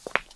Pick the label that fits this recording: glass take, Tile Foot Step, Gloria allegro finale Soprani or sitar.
Tile Foot Step